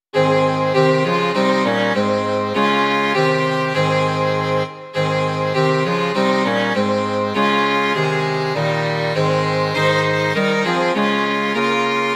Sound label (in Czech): 2 housle, klarinet a fagot; Ty nás proveď, Hospodine; Imaginativní verze